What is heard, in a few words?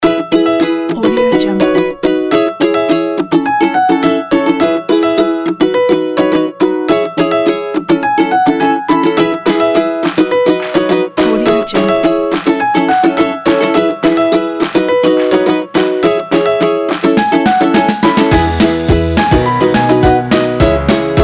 be happy kit